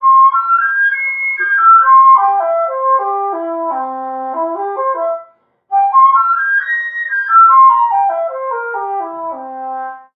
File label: Wind Instrument; Assassin's Creed Syndicate